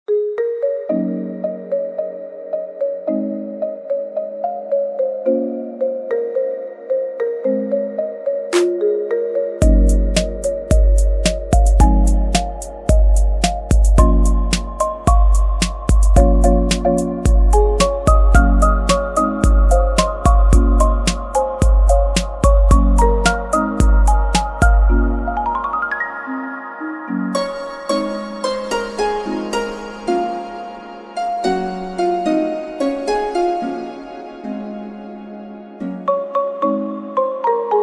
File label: lofi hip hop; Code Geass